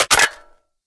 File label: mag out, weapons; vandal